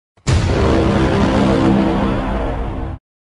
horn notification

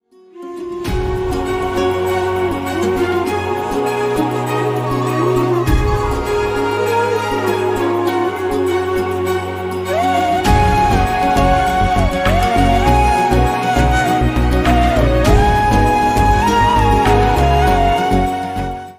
Music

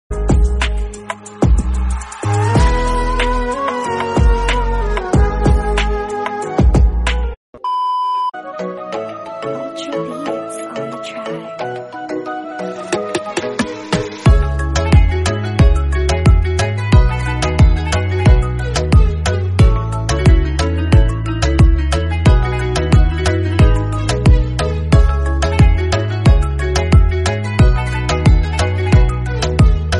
ultra beats bgm

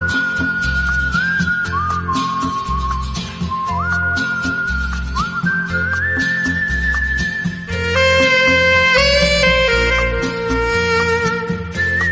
saxophone bgm

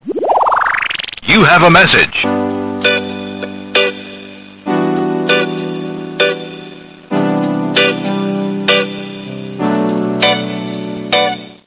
country music msg

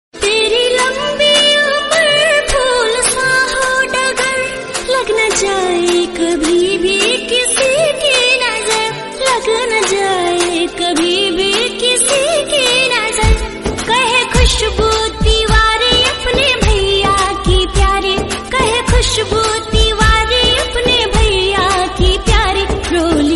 song hindi

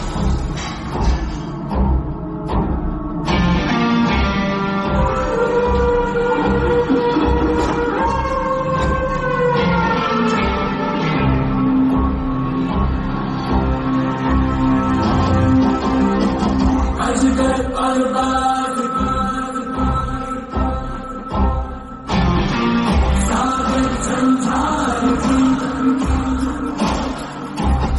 climax bgm; beast

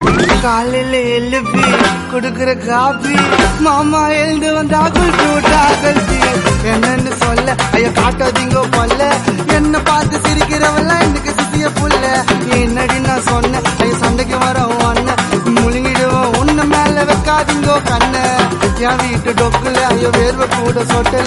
dj remix tamil song

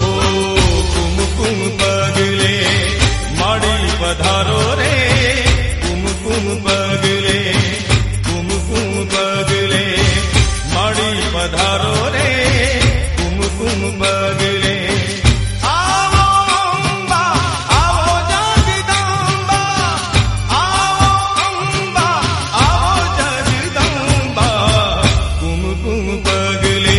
jain bhakti song

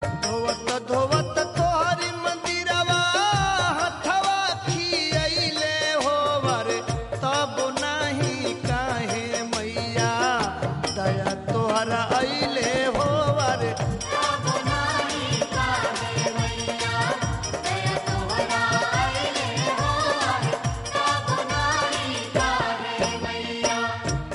bhojpuri devotional

